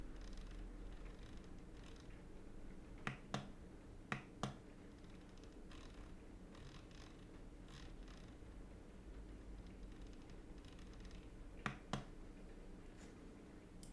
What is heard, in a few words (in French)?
son molette Corsair K70 Core; clavier; test clavier corsair k70 core